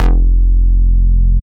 Bass Cuts; Instruments; VES1 Bass Cuts

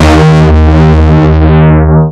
Root D#, Bass

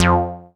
Synth Bass; Vengeance Samples; VEE Synth Shots

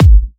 Hard Kicks; Vengeance Samples

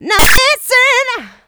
voc, female vox, Vocal House

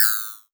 Special Hihats; Vengeance Samples; VEC1 Cymbals

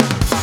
Fill 140 BPM

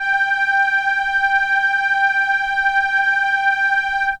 Horn G5, ARP Solina; musicradar-synth-samples

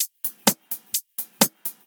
Electro Loop 128 BPM; Vengeance Samples

